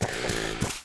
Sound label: Lizard war walk, Mob